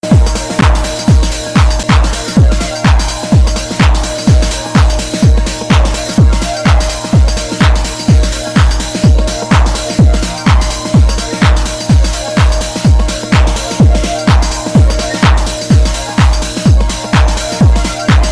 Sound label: 909 Strings; techno